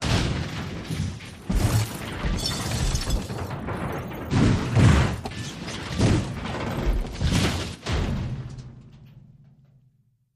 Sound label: Car Crash Roll